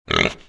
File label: Pet Warthog Grunt; creatures pets